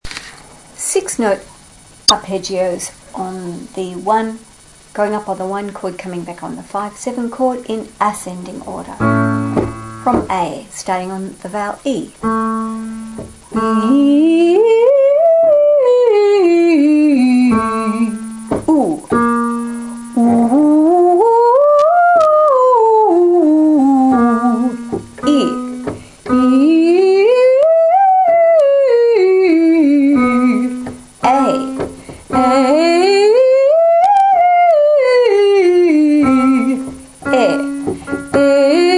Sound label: 6 note arps on 1-V7, Singing Exercises